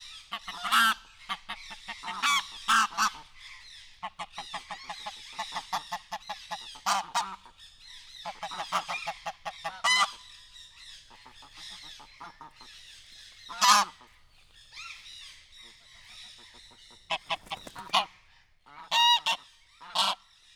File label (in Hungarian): erőteljes hang, nyári lúd; miskolci zoo 2018